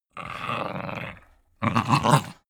chihuahua snarl fight soft; natur-tiere; hunde

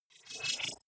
wobble hover swell short

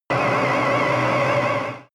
extrinsic siren, energie

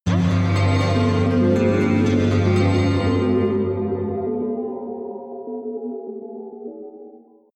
synth bells roll, drones

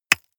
stone rock impact; natur-tiere; steine

